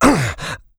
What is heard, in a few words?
pain light, player; small